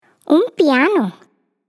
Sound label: PIANO